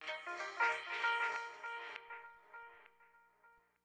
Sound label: Stab; Thirty-One Flavors